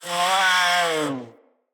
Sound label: Feral Vocal Death; Catbrow